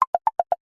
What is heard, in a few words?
multimedia notification subtle ui clicks pattern